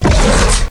ak47 deploy; zm boost; privilege

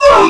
pain hurt; zmall

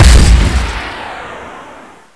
system jump; zmall

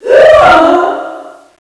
lilith pain death; zmall

